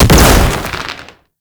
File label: Hellfire Projectile Explosion; Enemies; Abilities; Warframe; Tau; Roathe